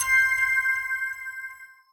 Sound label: count up end